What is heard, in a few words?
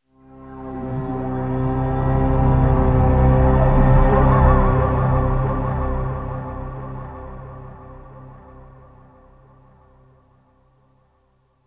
Tonal FX Cm 90 Bpm, Granular Tonal FX, Lo-Fi Kit; HipHop; Ghosthack; Advent Calendar